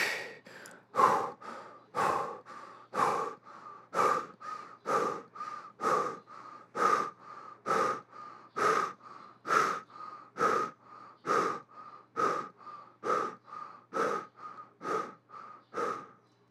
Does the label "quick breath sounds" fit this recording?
Yes